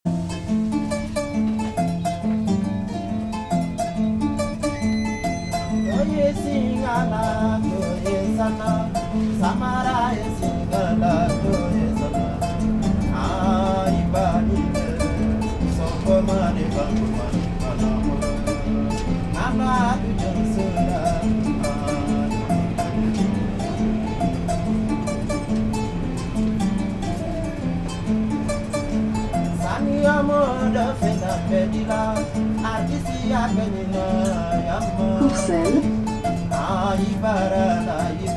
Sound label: singer in subway, Paris